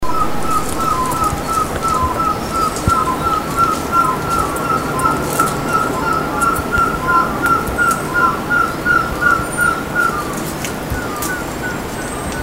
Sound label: red-breasted hill partridge; MT KINABALU BUKIT ULAR